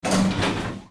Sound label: door